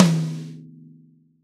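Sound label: Reg. High Tom, Roland BK-5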